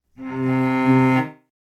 Cello Phrases C Minor (120 bpm); Instruments; Distressed Strings; Ableton; Mood Reel

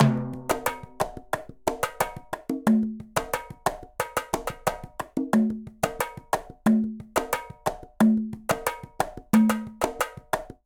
Murga B Tamburin 90bpm; Latin Percussion; Ableton; Factory Packs

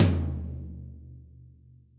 Tom Floor Cold Tight Room; Drum Hits; Standard Hits; Ableton; Factory Packs; Session Drums Studio

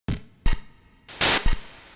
IDM Perc, IDM Percussion; Ableton; Factory Packs; The Forge by Hecq

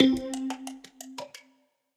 Music Box; Ableton; Factory Packs; Lost and Found

